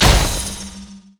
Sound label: arcthrow miss; LoL; diana skin02